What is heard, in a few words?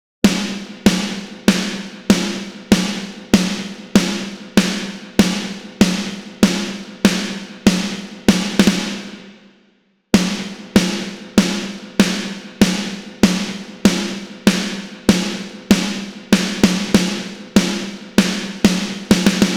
Snare Comp